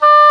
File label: WND D OBOE, Oboe D'amore